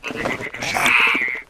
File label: VOLCANIC DEMOLIS WAR ATTACK